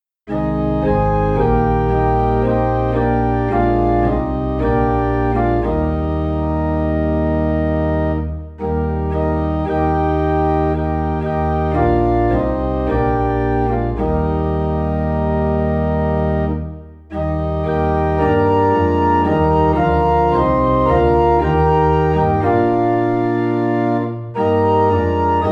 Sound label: Organ in E Flat